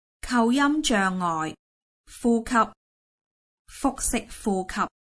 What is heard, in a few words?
exercise breathing; breathing2; aphasia